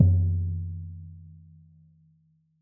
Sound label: Frame Drum Medium, World Percussion; Cymatics; Emperor World Percussion